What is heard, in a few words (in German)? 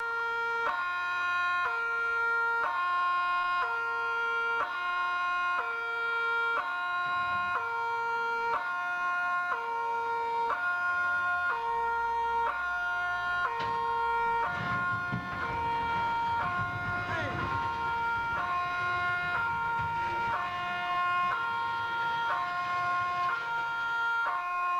feuer, feuerwehr akustik; technik; inside